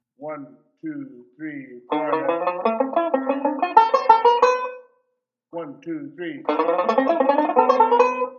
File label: ii V Pattern, banjo